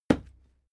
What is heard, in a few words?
fight impact sweetener cloth; nahkampf; soundpool